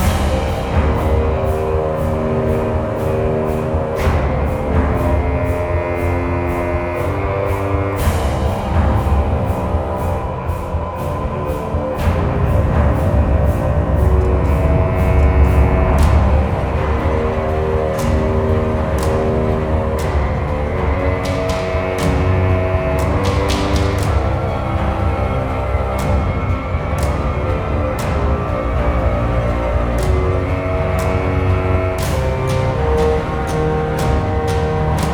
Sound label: Nature Loop; Jotun